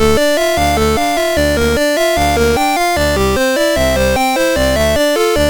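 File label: Malibu, MOS 6581 SID chip music, games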